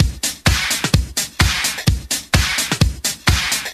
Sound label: House Beat, 128 BPM